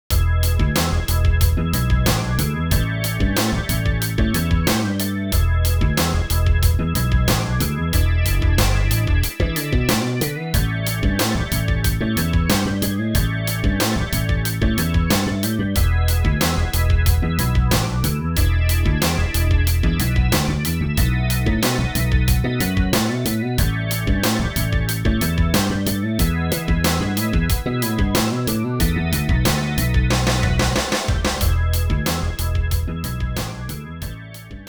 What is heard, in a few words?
Slap Shake; Bacchus